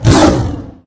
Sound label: snork attack; STALZONE